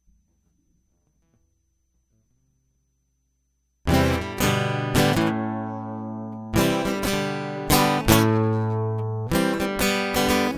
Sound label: Going In Guitar Loop; hyper terrestrial